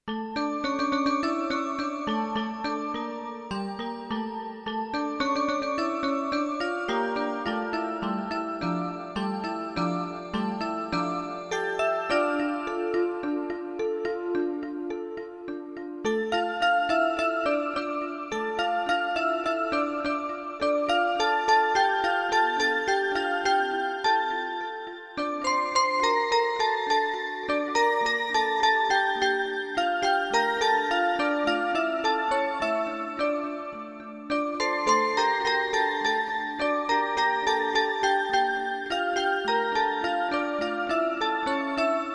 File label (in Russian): Мелодия на часы; 1 сентября День знаний